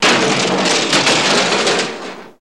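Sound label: Metal Crashes Tumble, ransack room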